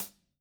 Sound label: STK RMP, SD HATS, Living Drums; Sampleheads; Peter Erskine Living Drums